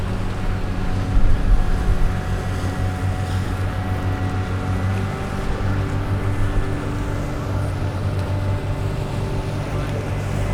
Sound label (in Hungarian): stégről, velence; olaszorszag; adovevo